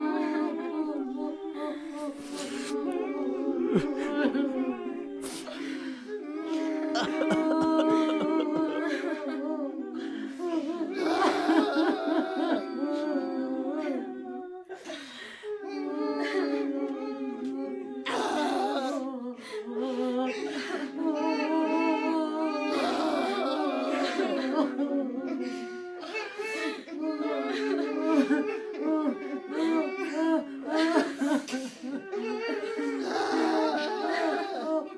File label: Scenario People Crying Loop